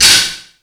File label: Found Sound, Drum Machines